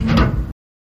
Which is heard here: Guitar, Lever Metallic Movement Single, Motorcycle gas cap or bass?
Lever Metallic Movement Single